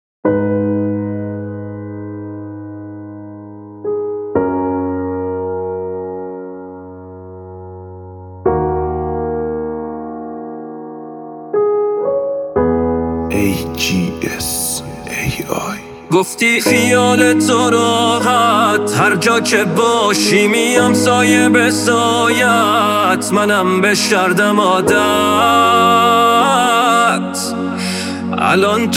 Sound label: piano